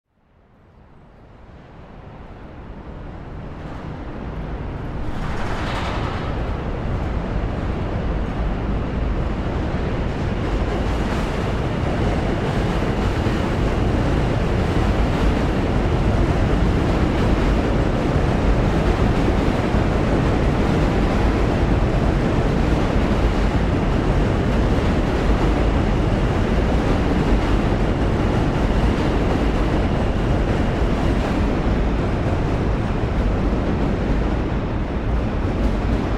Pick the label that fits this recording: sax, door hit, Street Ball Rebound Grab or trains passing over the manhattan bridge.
trains passing over the manhattan bridge